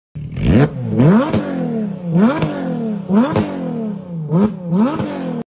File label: gt3; gt3 violette; carface